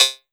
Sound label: RIM, 160 BPM; Ueberschall; Drum & Bass Flash Trackz